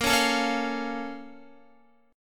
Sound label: Bb Minor Major 7th, Guitar; BbmM7; strummed; x,13,13,14,13,13; JGuitar